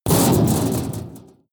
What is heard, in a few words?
metal impact sheet bright rattle medium; hits explosionen; metall